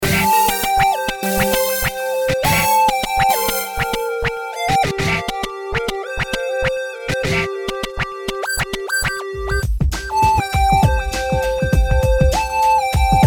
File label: beat